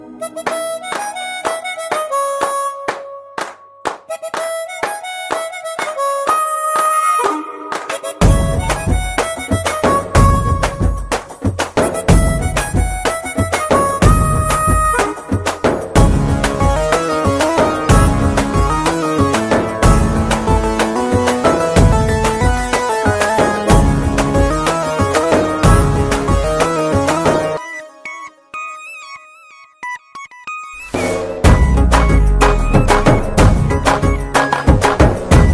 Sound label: Instrumental Tone; Pandaga Chesko; Telugu